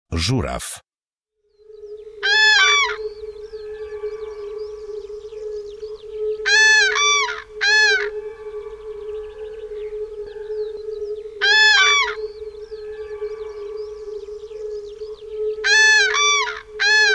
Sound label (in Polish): żuraw